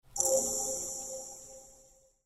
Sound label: task gained info sound